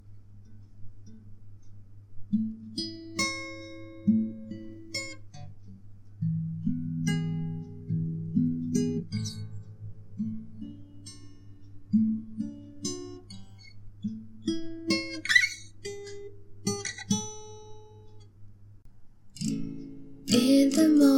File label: acoustic cover